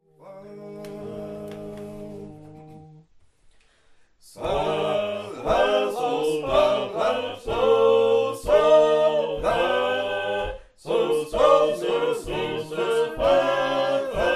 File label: small group, sacred harp; Frewsburgh